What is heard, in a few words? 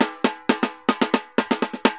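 TAMBOR SALSA, salsa drums and percussion; Best Service ProSamples vol.14; AKAI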